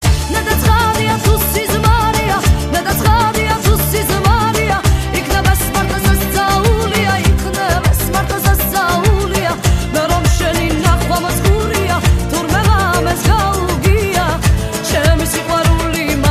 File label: Georgian dance song